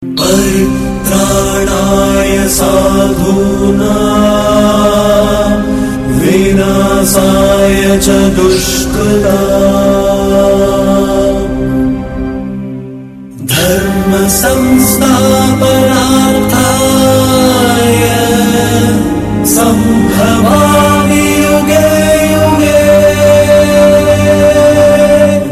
chant; arasaktchi

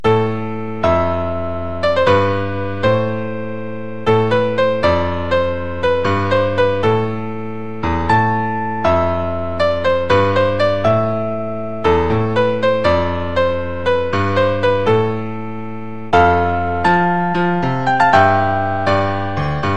piano

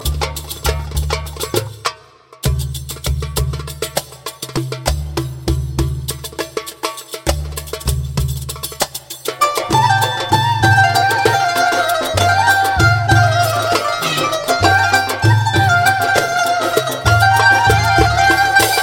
syria tradition music

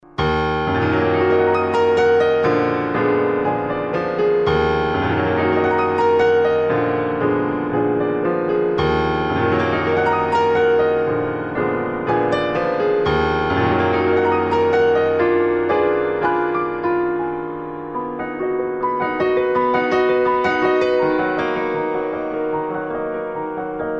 piano

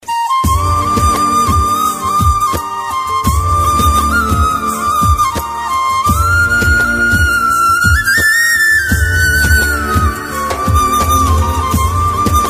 sentimental flute; Indian

